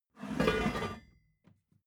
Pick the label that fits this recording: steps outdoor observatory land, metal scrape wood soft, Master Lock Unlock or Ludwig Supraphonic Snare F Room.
metal scrape wood soft